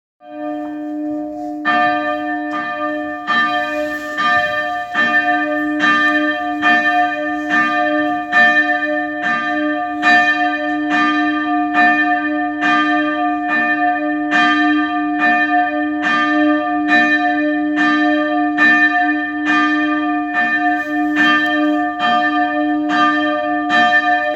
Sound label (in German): Glocke